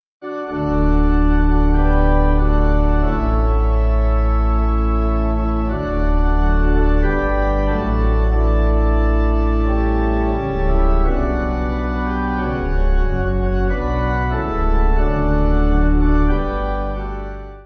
Pipe

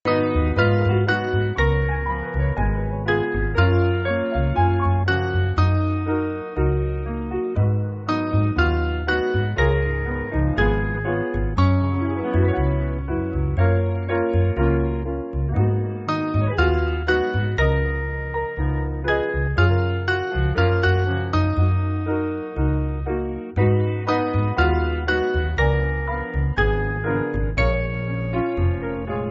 Piano

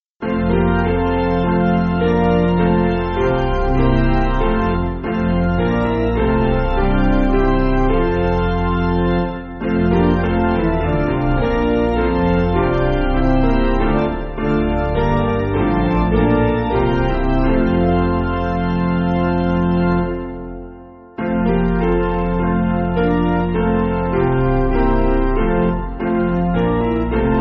Piano

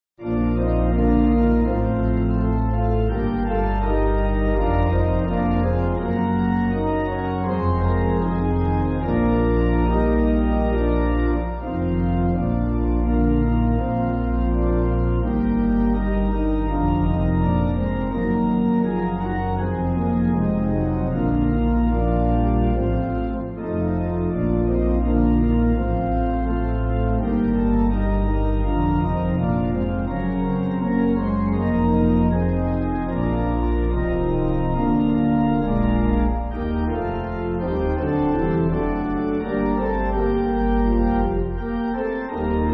Quiet Pipe

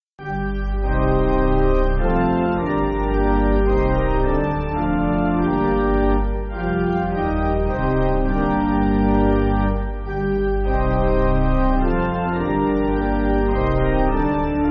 When Grief Is Raw Pipe

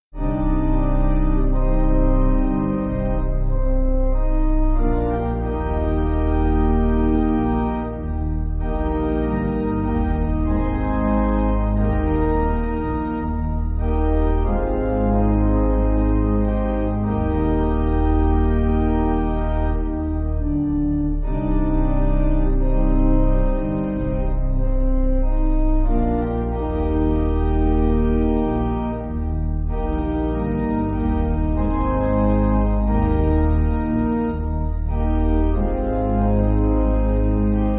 You Lord Are In Pipe

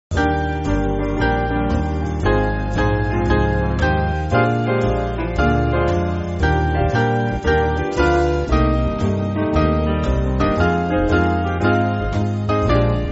Band

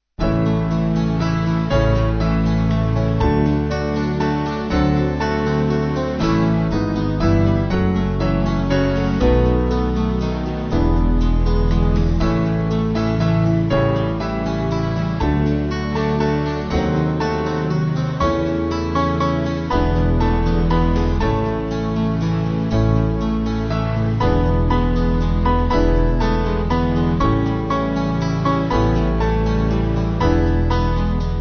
Band

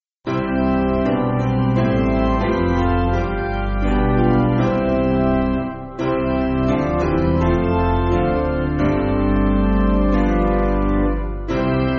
Piano

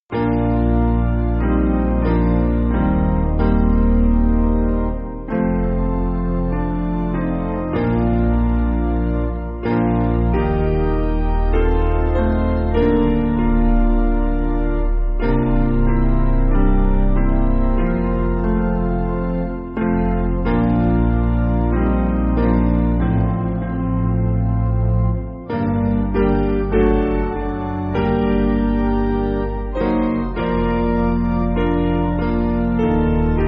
What Can I Give Him Piano

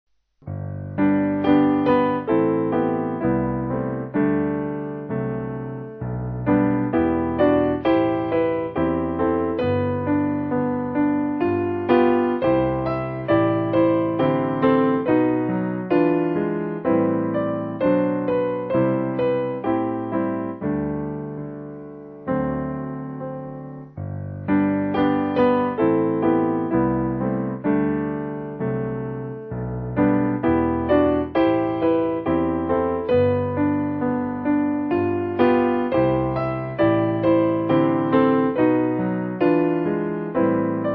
Piano

